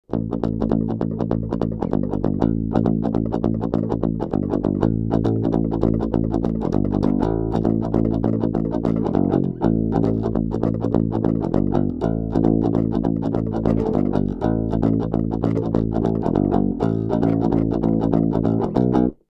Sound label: midhigh pick